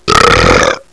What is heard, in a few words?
burp